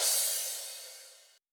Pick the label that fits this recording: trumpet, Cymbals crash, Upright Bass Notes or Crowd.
Cymbals crash